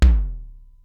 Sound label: Simmons SDS-9 drum machine